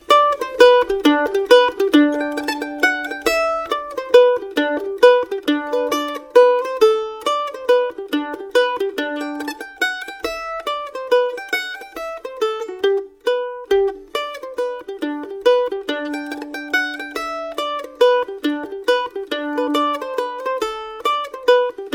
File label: hornpipe, mandolin; greencastle